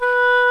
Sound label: WOODWINDS